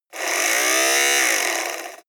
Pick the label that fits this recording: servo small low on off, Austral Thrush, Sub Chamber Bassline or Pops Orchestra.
servo small low on off